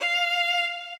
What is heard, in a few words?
solo